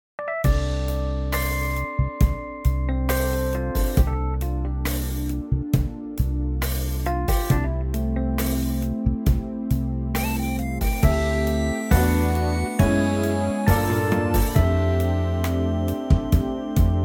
F groove; key - F - vocal range - F to Bb; Fabulous R n B groove based reworking; with cool synth lead lines, Rhodes piano

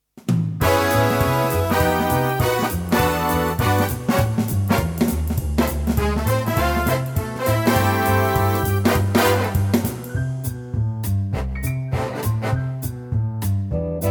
swing

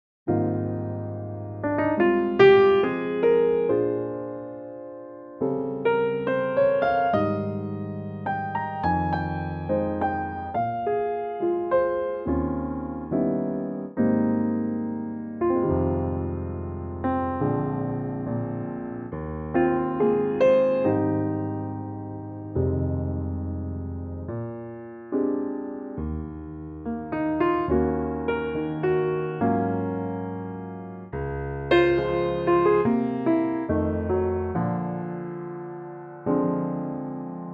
Ab piano

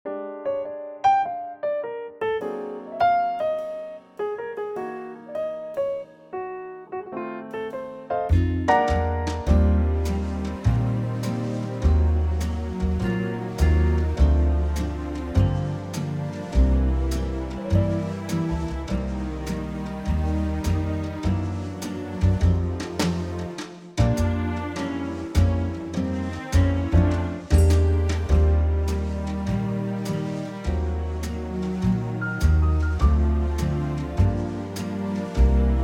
Bb

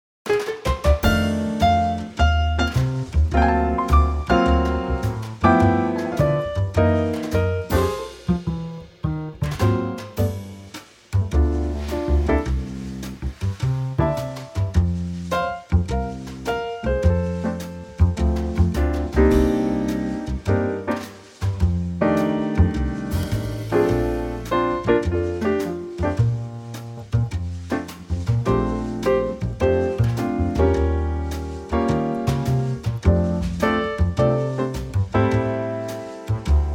trio F

